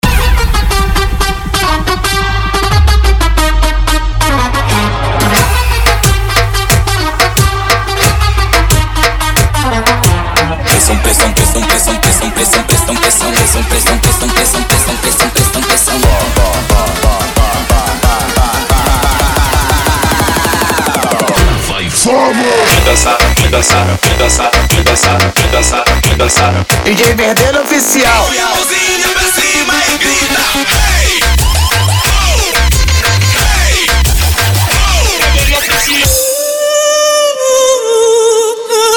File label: SAX OF ROCK DOIDO; Tecnomelody 2024